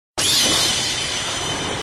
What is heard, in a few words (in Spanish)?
sonido sharingan activado